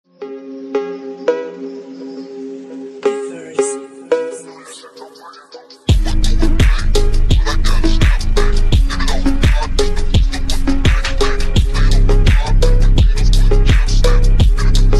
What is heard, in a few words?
slowed reverb